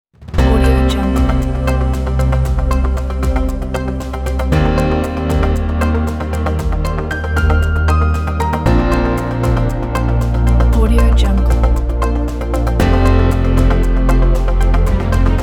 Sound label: news intro logo; beautifulmix